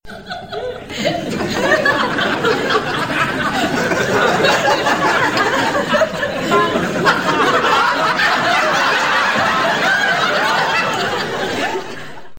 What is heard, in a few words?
crowd laughing